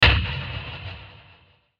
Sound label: Hit Hollow, Hit Hollow Warble Reverb; Hit Hollow Filter Warble Reverb; Blastwave